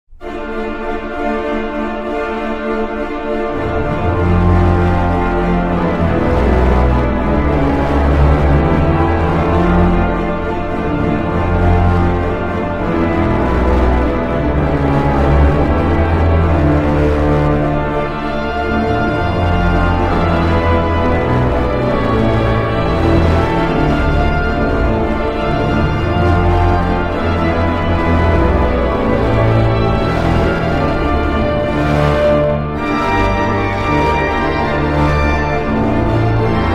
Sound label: waltz heroic